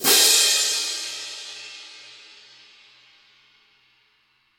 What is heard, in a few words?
long tail reverb crash cymbal sound a sharp key